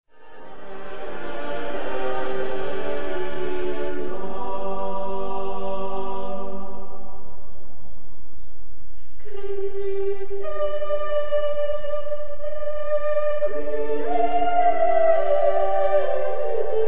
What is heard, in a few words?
Mass for 4 voices Kyrie; Certon; 'Sur le Pont D'Avignon'